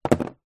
leisure puzzle jenga wooden block down in cardboard box; zapsplat